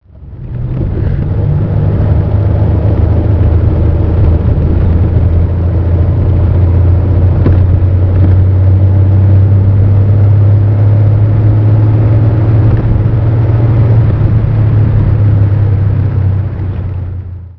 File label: bentley drive